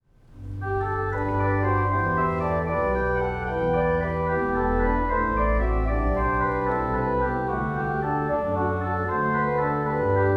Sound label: Jesu Organ